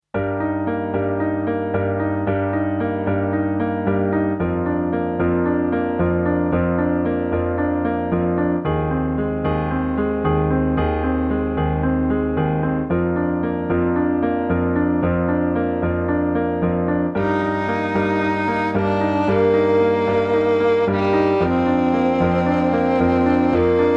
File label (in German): sweet duett